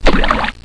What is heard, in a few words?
Small Drop in Water